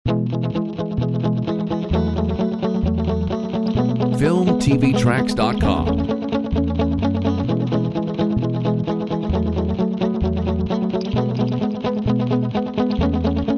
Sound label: Rock Stellar